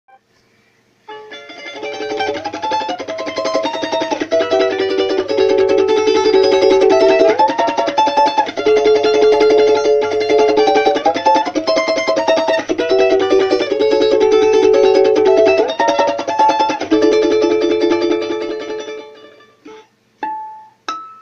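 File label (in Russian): мелодия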